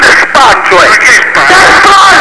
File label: collect